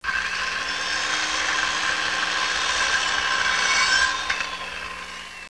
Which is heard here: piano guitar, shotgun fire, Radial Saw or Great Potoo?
Radial Saw